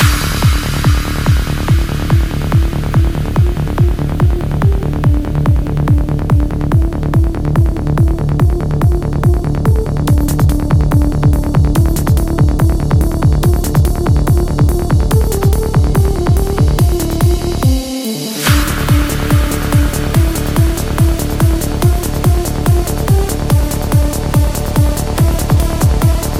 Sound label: Rave; Barotrauma; DynamicEuropa; Exosuit